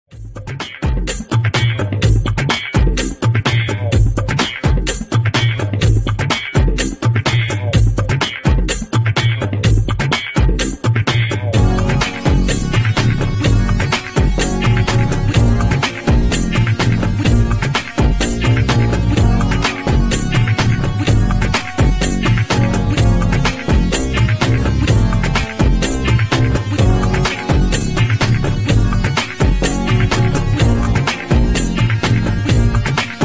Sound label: Night Groove